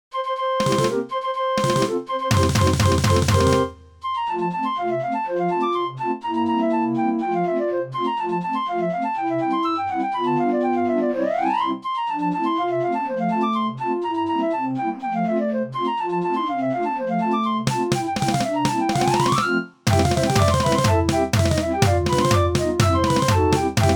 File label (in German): Trompeter Stuckchen, draaiorgels